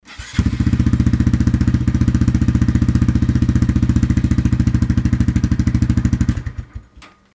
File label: monkey125 idling